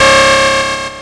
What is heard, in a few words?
Atari Noise Long, Atari 2600